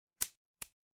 paper fabric cloth whip

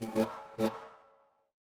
feedback error

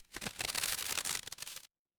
paper fabric crumple

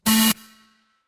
feedback error

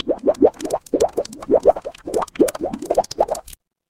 water bubble

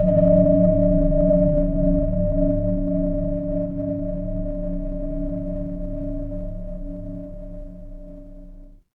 horror ambience dark

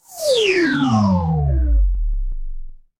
transition sweep

